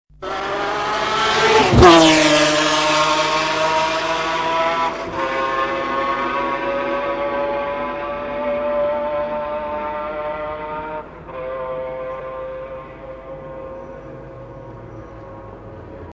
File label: Car sounds McLaren F1 Gtr Le Mans